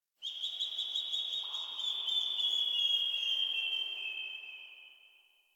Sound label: Tree Distant Critters Happy Calm, Ambience